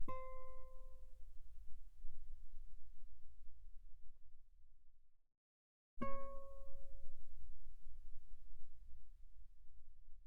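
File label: Guitar pianissimo sul E C5 B5; mono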